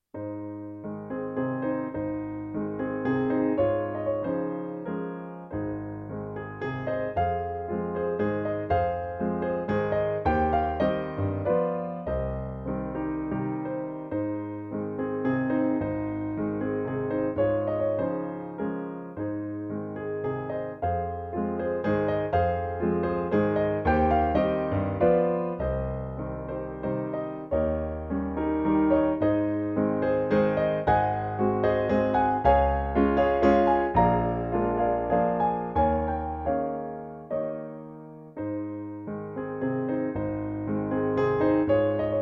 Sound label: Brahms Waltz in Ab